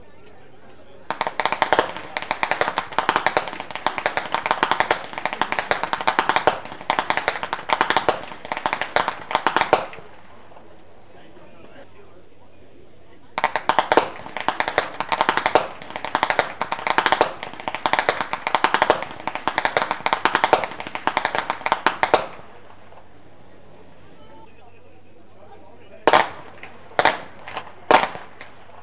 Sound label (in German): schnalzen, flachgau; steindorf